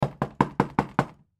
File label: Door Knock Multiple, Door Knock Multiple Close Perspective; Apartment; Household; Door Knocking